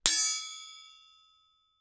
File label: Bell Metal Handbell Short Ring; Foley